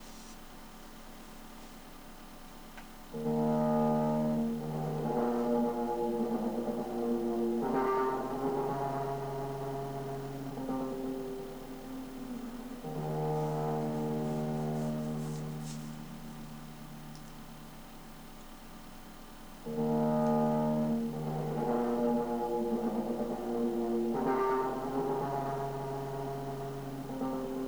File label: Ambience; Ambience for April